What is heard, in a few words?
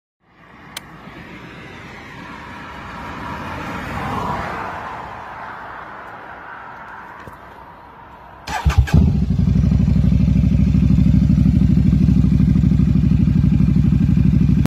RC exhaust